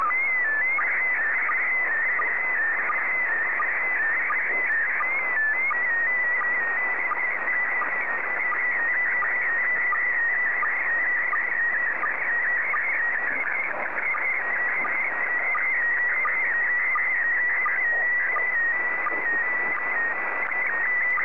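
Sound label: PD90, slow-scan television; ZL2TJM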